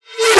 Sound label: riser 150 1 bar reverse; Virtual Riot